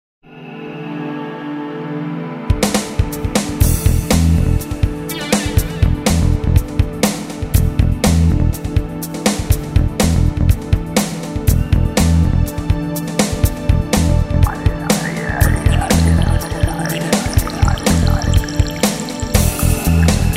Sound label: Tibetan